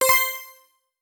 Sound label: Synther Blips Up; ghoulboy windows gamerip 2017